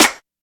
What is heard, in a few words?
airy sizzle snare drum sound d sharp key